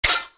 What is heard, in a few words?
attack pre launch